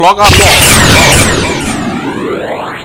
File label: clock up; yuusha rider; kabuto